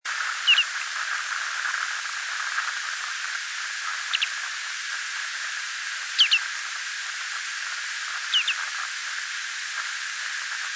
canto do macarico de costas brancas

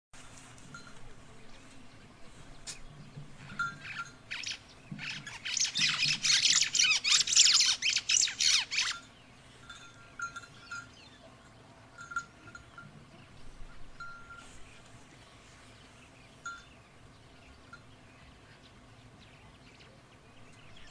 canto do abelharuco nubio

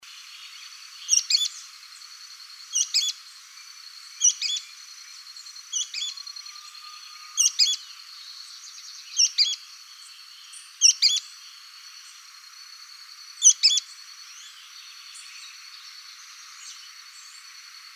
canto do petrim

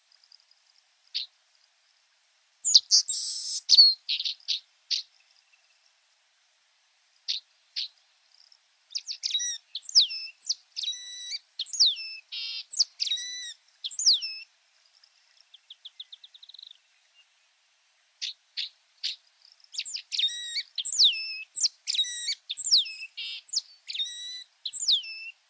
Canto da loica de sobrancelha branca

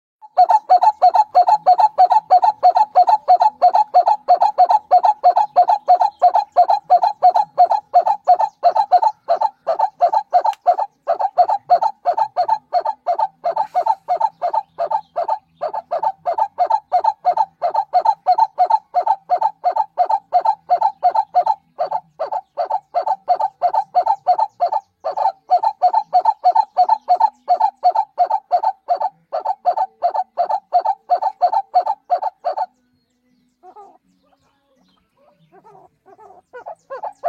Canto do Uru de topete

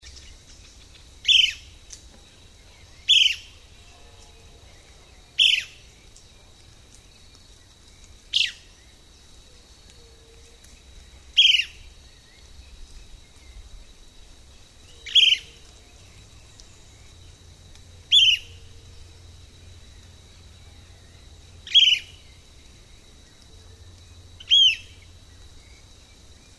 canto do arapacu escamado do sul

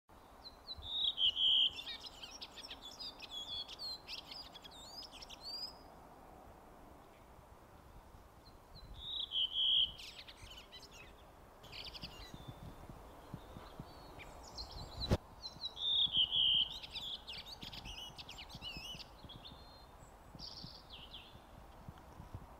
Canto do tordo ruivo

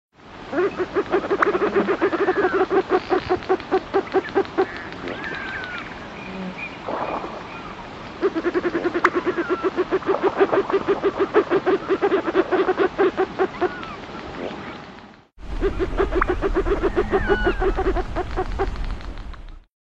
Canto da marreca de cabeca preta

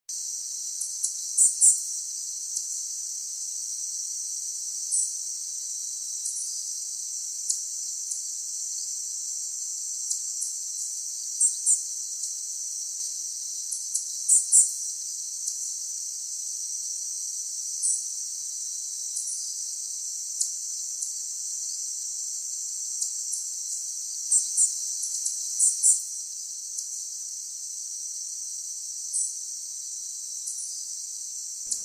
Canto do sai de mascara preta

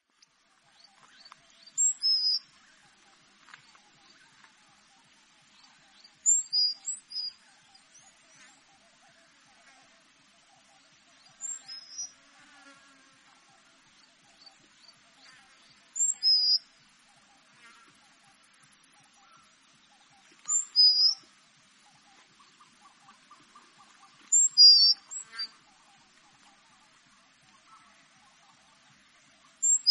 canto do peito celeste de face carmesim

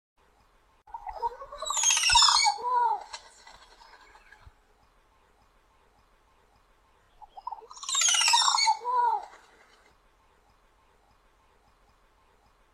Canto do Japu preto

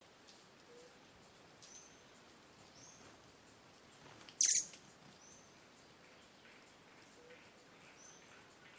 canto do balanca rabo canela

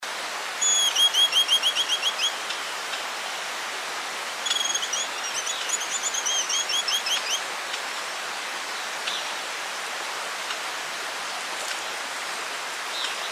canto do caneleiro

